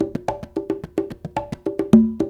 World Congas, 108

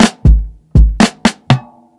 120 FILL, DRUMS LOOPS; BASEMENT DRUMS VOL 2

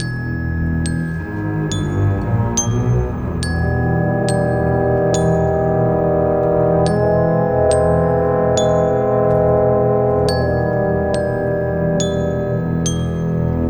Cinematic Orchestra

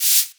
Shaker; Equipped Music; Loungin House

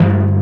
Headed Firebreather; SNES; Brandish

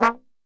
A#1, Brass Super Section, TRMB SHOT; Best Service

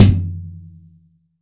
15 INCH KICK

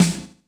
ROOM S, ROOM KITS; Crypton - DrumBase5000

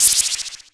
MS-20 FX up12; Digital Sound Factory

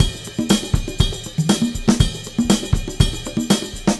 Combo, R&B Drums

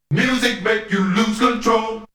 Vocal; ZERO G - Datafile DISC 2

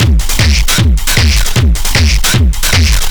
LOOP, Eurotech; Sounds Good

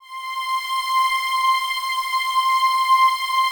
PAD, DIGI-PAD

